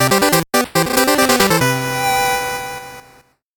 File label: 8bit jingle fast